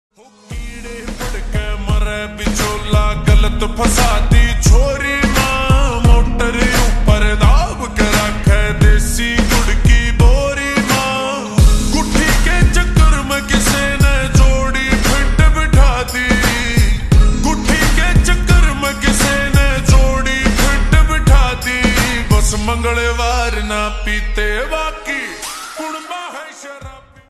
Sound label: Slowed Reverb Ringtone